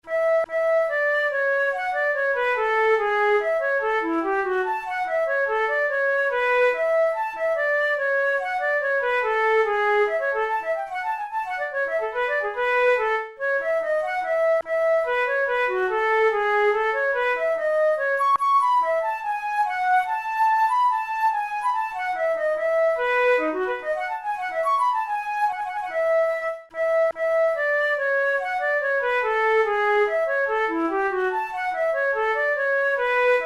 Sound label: capricen rondeau, flute; stamitz